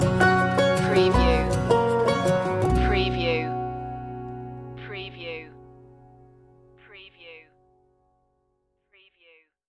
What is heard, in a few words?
blue grass mellow stinger